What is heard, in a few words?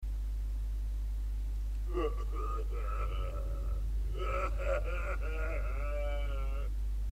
orc cry; Orc DK cry